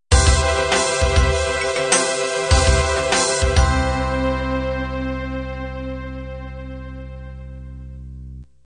slow ballad end

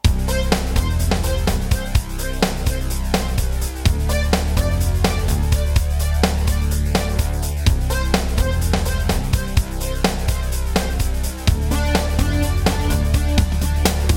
jungle

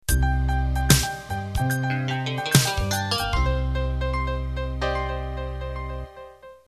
Swing Rock End

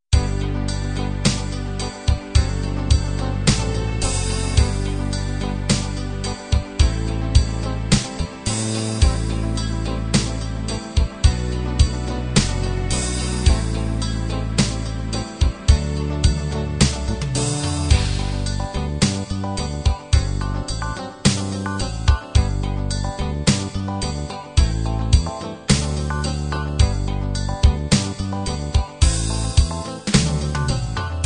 halftime ballad

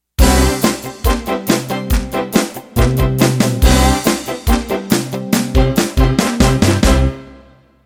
Country Boogie End

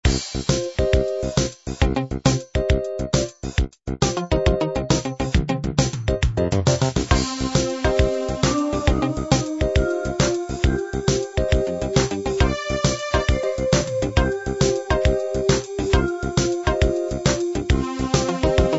fusion

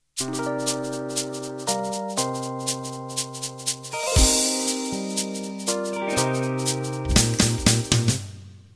Smooth Jazz Intro

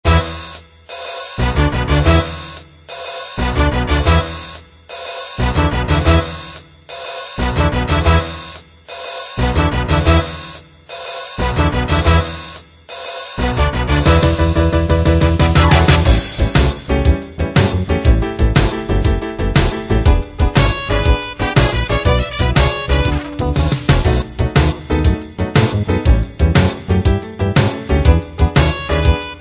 blues with breaks

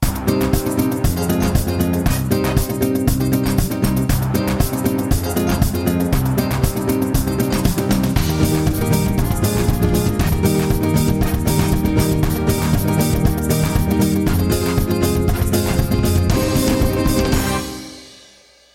Gypsy

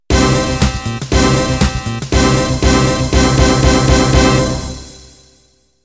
House Garage End

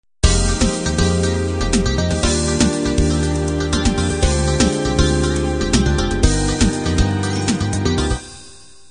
8bt analog intro

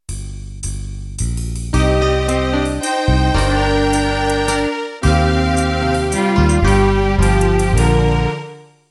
Country Waltz intro

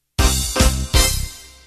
Groove Funk End

